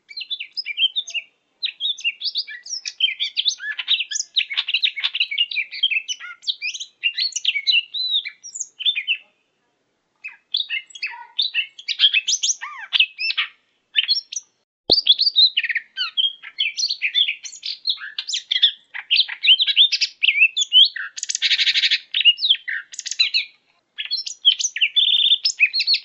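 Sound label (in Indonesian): suara cucak hijau medan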